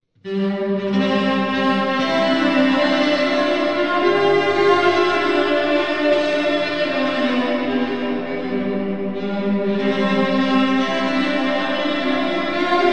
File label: cello cathedral; reverb demo